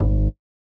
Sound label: bass G#2